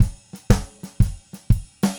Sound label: Red Maple Groove 120, DW Red Maple Kit, Vital Drums; 120 BPM